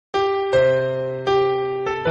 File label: noot noot soviet anthem